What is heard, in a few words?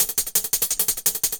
Hat 170, Perc Loops, Drum&Bass; EZRollers